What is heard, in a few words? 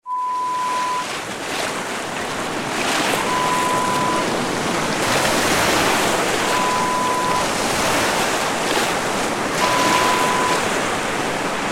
Strong Ocean Waves